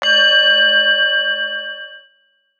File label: Reverb Bell; Samsung; GT-I8320 Vodafone 360 H1; Ringtones